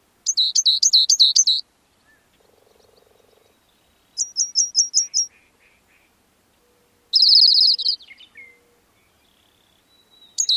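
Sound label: song, Rock Wren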